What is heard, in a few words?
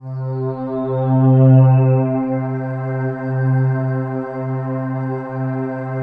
COSMIC, PADS; Masterbits; Vintage Voltage